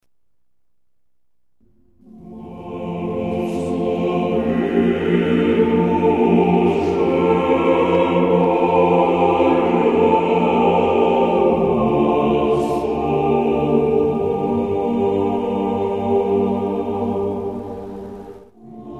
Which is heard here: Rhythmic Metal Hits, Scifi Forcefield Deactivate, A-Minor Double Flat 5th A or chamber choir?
chamber choir